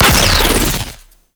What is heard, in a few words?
Burst Laser Shot; Warframe; Teralyst; Ropalolyst; Abilities